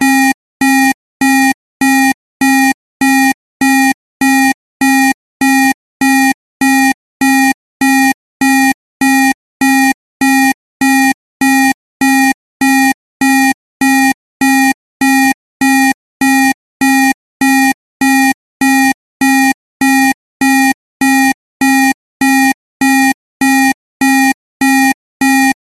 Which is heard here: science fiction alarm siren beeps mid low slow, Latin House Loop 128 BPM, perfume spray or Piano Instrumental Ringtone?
science fiction alarm siren beeps mid low slow